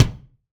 BD, BASS DRUMS; EdgeSounds

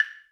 Hi Wood Block; Zoom RT-123

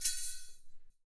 METAL; ILIO; Ethno Techno; BEDROCK 126

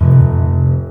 THUD, The Piano; InVision Interactive

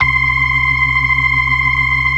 BLOOD OCT R, ORGAN

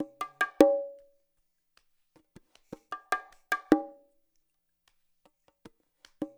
LOOP, Percussion

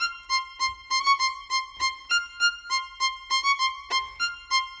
Violin; Sonic Foundry (Sony Creative Software); Orchestral VOL-2; Modern 06

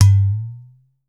udu

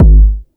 DUTCH KICK; Masterbits; Soniq Elements

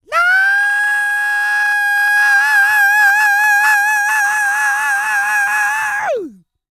YELL, MALE HUMAN; Spectrasonics Vocal Planet

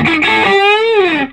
Vintage Blues Guitar, SOLO

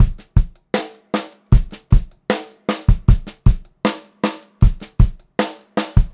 Funk-Groove Drumloops, 78 STRVAR; USB Soundscan vol.38; AKAI

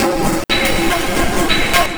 Percussive Break 121, Lounge Drumz

